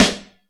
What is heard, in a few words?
Fat Snare; Drums; Snares